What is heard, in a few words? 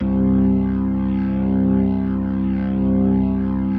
C1S, B3